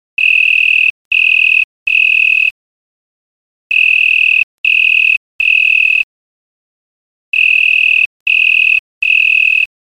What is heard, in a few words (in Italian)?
grillo ocanthus